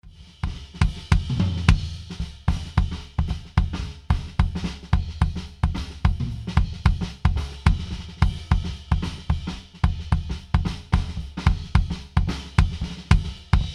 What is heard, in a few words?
Drums Kick EQ On